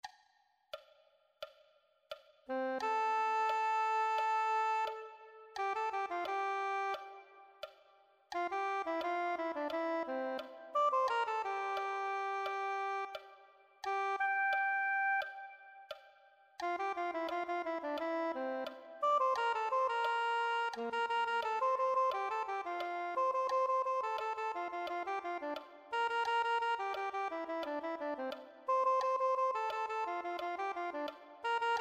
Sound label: soprano saxophone